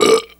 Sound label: burp short